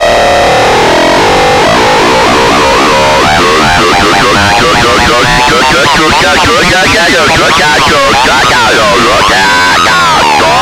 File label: 5 pulser xor formant